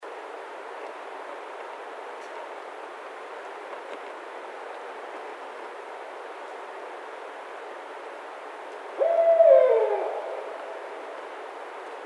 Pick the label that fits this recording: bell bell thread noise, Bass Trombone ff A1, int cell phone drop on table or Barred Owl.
Barred Owl